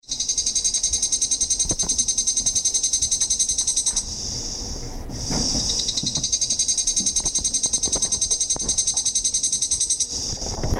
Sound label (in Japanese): koe higurasi; 2019semi